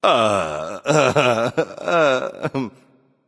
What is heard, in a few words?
surprised; smite; anubis